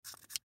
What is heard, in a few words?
Rifle Round, Rifle Round Place In Holder; Gun Handling; Guns; Warfare; Gun Handling (Sound Effects:Guns); Blastwave